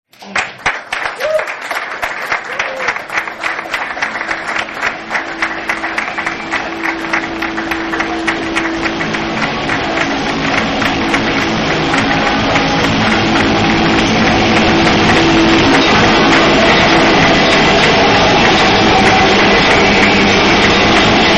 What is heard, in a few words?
Noise